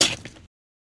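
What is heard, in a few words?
Footsteps On Wood Floor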